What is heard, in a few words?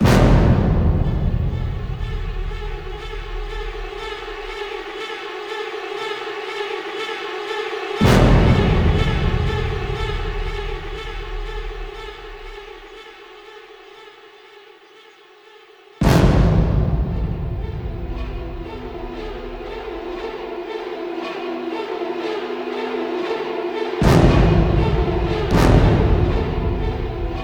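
Music Tension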